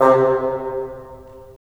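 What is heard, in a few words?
wind bassoon; LA Composer vol. 3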